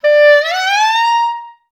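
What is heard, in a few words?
glissando D4; Best Service; Peter Siedlaczek's Advanced Orchestra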